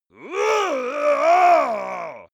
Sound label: monster male growl aggressive; kreaturen